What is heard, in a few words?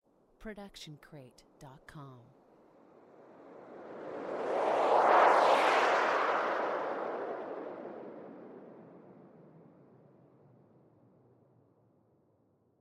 plane fly by; soundscrate